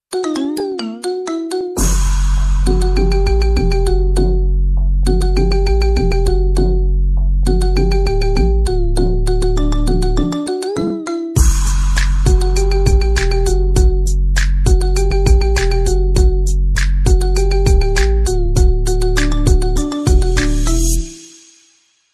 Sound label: Marimba Remix iPhone Ringtone; iPhone 13